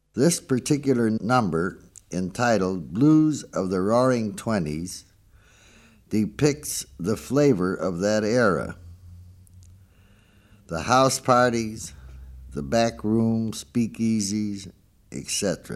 BLUES QUINTET

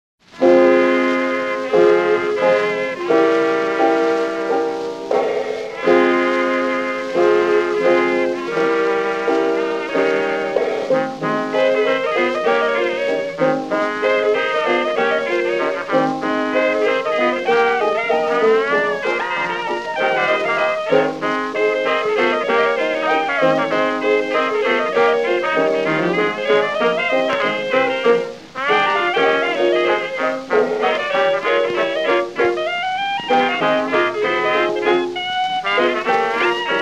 blues

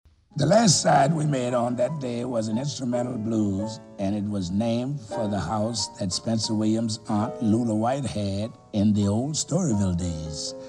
Stomp

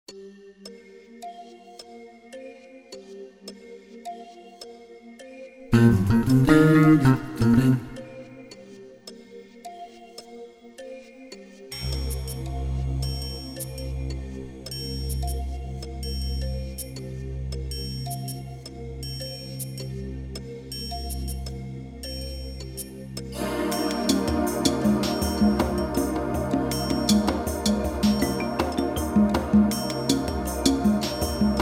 Dream funk

